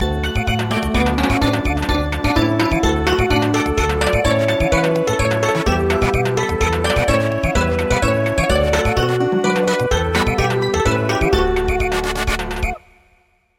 Samba

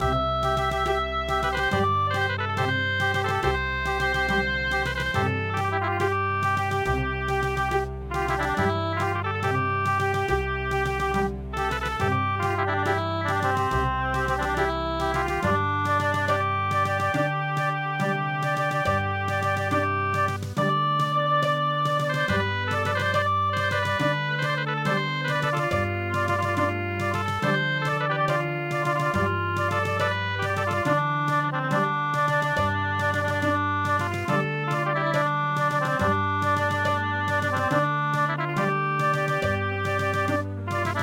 bolero

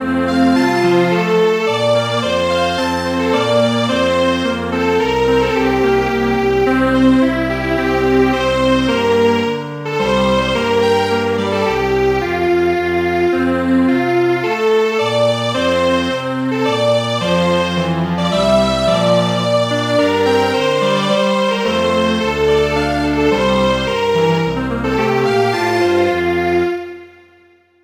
Strings Quartet

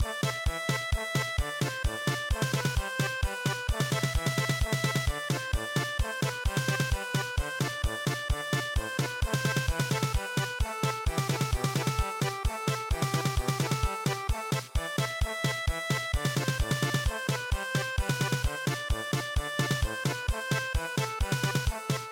German Polka

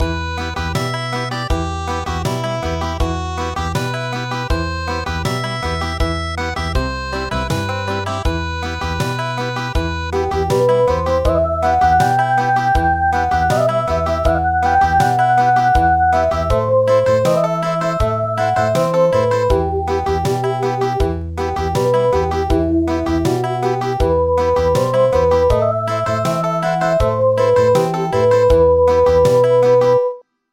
Country; Danchone Bushi

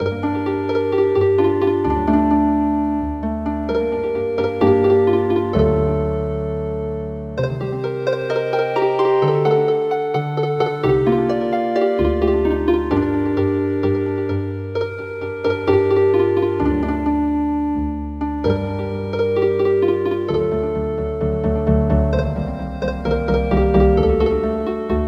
chirp chirp chirp Healin Harp

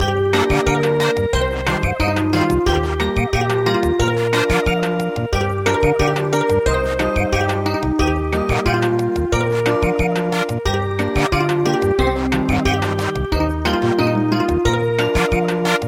Thai lullaby Samba

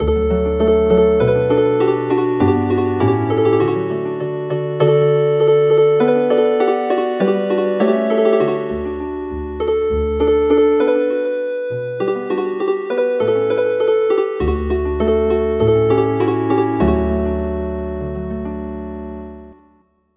Healin Harp; Many Years

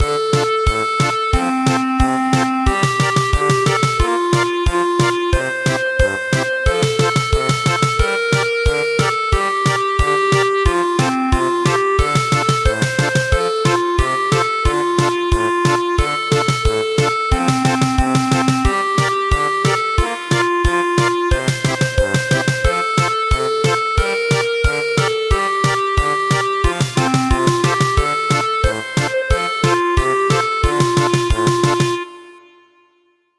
German Polka, midi

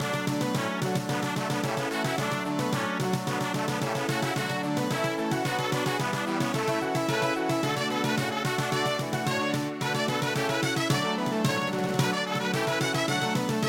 Brass Band March; Pomp and Circumstance

